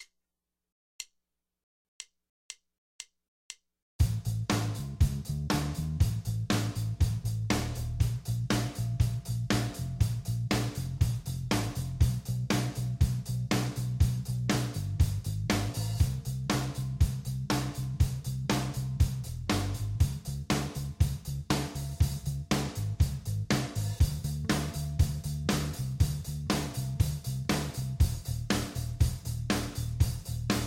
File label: Major minor chord regions Rock 120bpm 4 4; 50bars